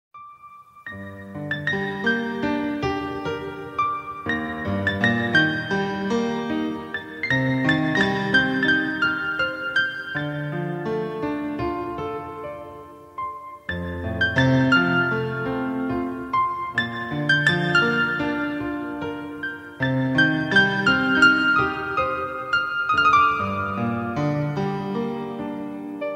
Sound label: solo romantic ringtone